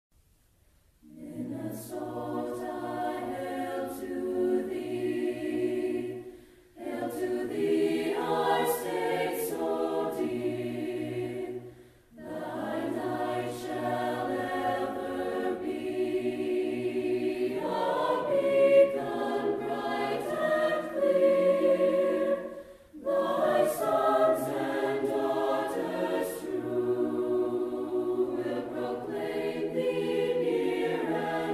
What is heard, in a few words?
state song with vocal; minnesota; hail minnesota